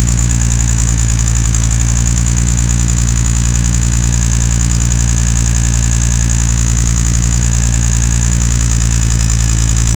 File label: two stroke karting engine